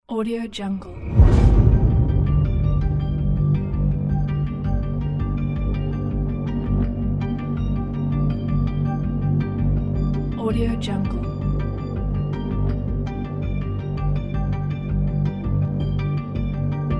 suspense atmosphere rhythmic soundscape background pack